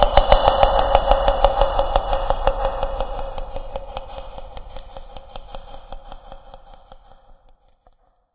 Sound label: death; Barotrauma; Barotraumatic; Legacyendworm